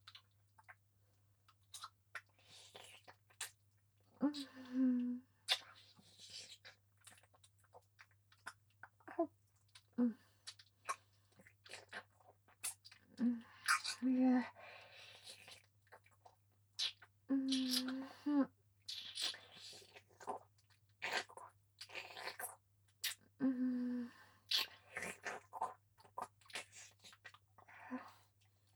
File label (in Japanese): フェラ 普通